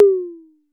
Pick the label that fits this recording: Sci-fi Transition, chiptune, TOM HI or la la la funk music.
TOM HI